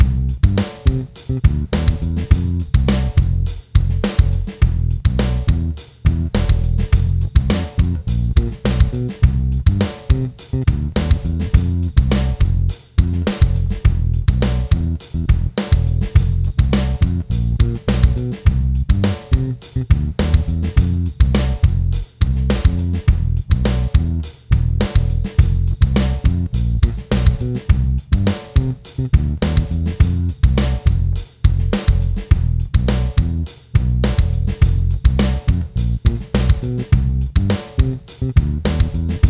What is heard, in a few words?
Bass Drum 104 Bpm